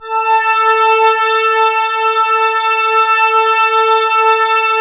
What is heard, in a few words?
MXWALL, MATRIX 12, Vintage Keyboards; Big Fish Audio - First Call Vintage Keyboards